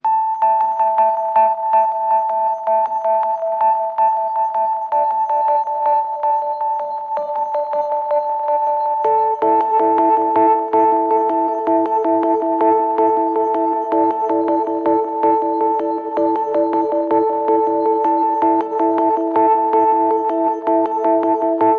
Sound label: Ambient